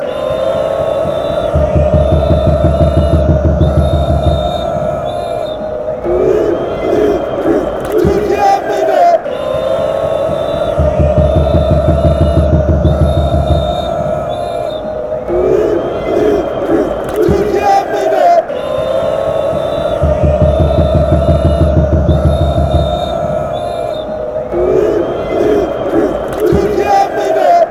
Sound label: oooooh, fanchants